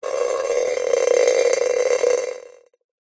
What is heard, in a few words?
nature water drips sudden short metal container small